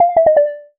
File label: low battery